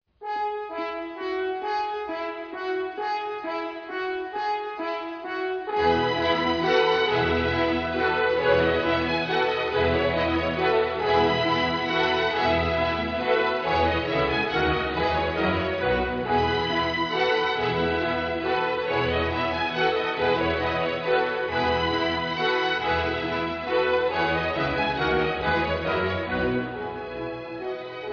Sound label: Carillon